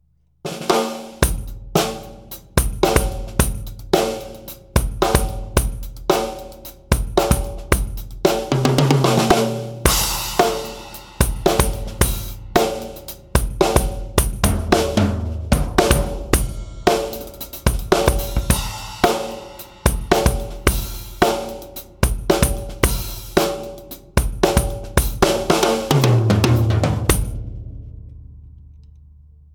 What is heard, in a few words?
Jingle Kick; YAMAHA EAD10